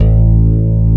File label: AMB BASS